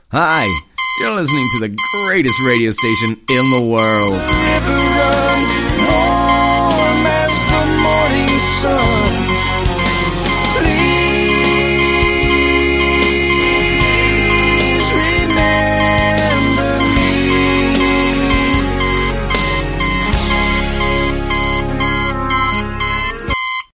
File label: alarm